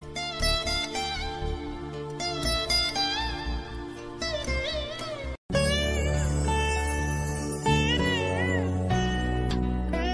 Background Music; Manmadhudu

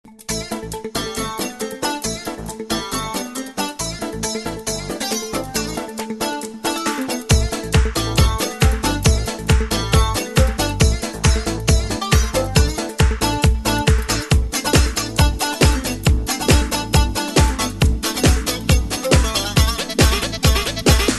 ringtone

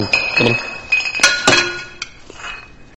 Beer Bottle

